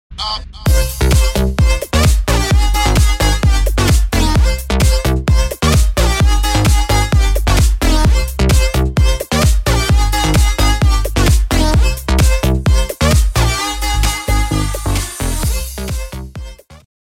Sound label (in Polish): klubowe zm